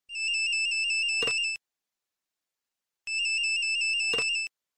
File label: nokia e90; Çok Çok İnce Ton Ofis Telefonu Zil Sesi